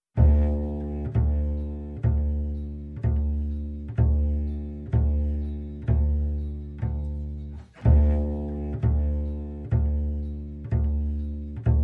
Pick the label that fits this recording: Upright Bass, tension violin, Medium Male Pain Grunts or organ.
Upright Bass